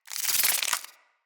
Root Spider Foley Spin; Animals

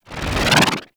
Golden Band Staff Expand; Warframe; Monkey King

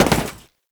Step Metal Perforated, Foley; Warframe; Oraxia

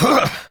Injured Grunt; Warframe; Tutorial; Injured Ostrons

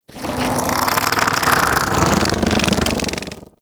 Bug Vocal Idle; Characters; Warframe; Hunting Critters; InfKDriveBug

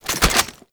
Grenade Launcher Reload; Weapons; Scaldra

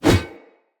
One Handed Axe Elite Whoosh; Weapons; Enemy; Axes; Sinecure One Handed Axe Elite

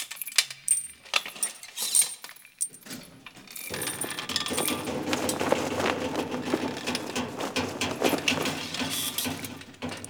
Camp Ambience; Props; Grineer; Warframe; Eidolon Plains

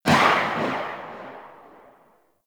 Bladed Pistol Tail; Warframe; Weapons; Tenno

